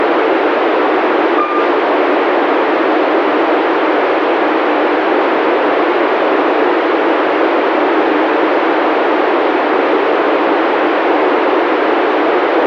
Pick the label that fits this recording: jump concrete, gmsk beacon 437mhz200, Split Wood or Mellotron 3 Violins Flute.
gmsk beacon 437mhz200